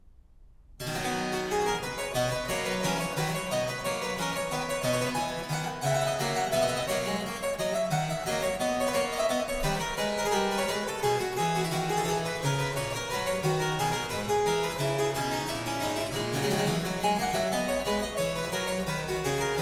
Flute sonata Vivace; BWV 1032

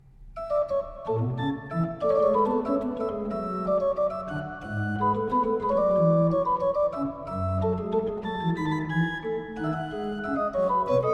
Gamba sonata, Allegro moderato; BWV 1027

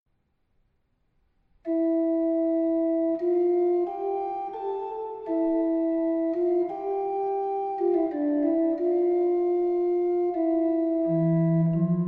Fantasia a4 phrygisch; Anthoni van Noordt; Vierde

